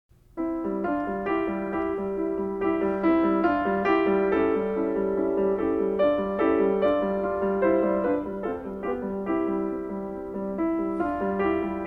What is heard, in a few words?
Etude In C Major; Magrath Book 06